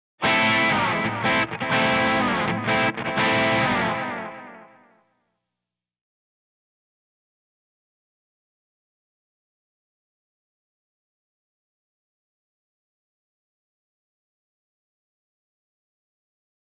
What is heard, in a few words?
b gtr